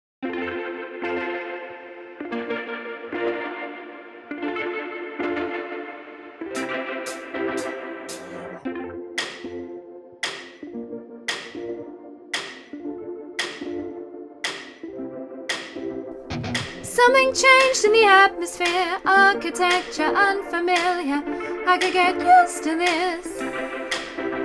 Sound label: soprano